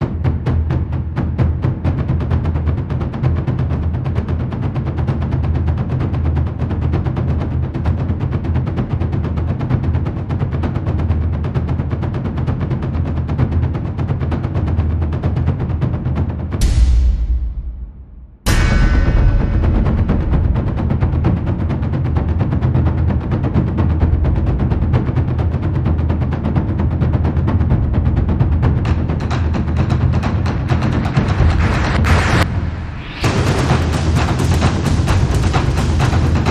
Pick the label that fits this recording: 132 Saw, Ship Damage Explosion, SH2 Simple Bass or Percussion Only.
Percussion Only